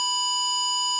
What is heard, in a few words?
pow